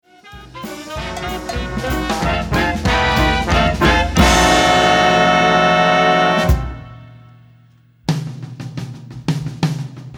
Dinner Music

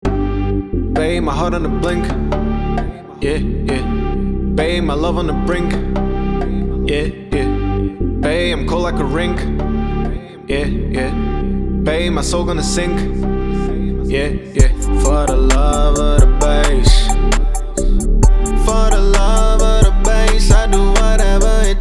bass

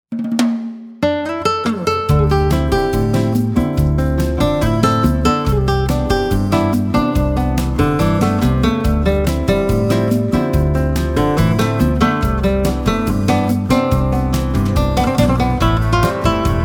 Bossa Nova

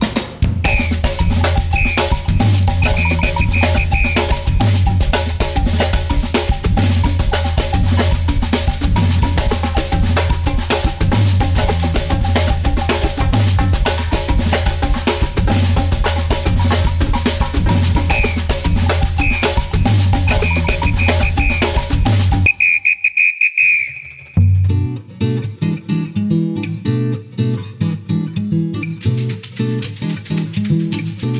Batucada; Swan

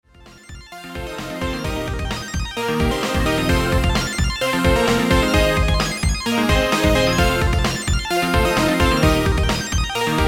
Arp Madness

